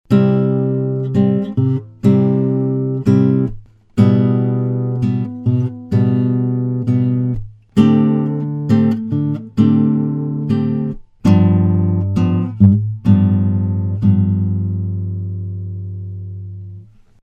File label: Universal Audio SC 1 A Gitarre Preset Acoustic Guitar LD 414 AKG414